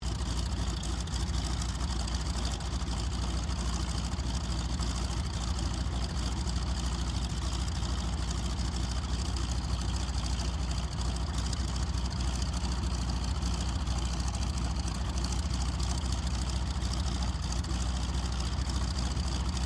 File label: AIRCRAFT CAC CA-25 WINJEEL IDLE DISTANT, fighter trainer; RAAF Winjeel; Airplanes